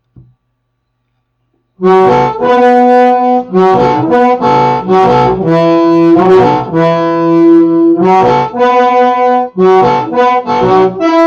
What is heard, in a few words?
octaves electric, harmonica; DIY bullet style harmonica mic